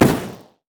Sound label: micro rockets; LoL; heimerdinger